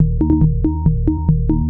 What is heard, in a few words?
Bass; Techno Music Maker